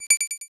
square wave; ゆめ2っき

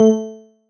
marimba; ゆめ2っき

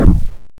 wind

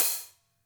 marimba drum; ゆめ2っき

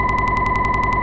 glitch; ゆめ2っき

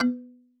marimba kalimba; ゆめ2っき

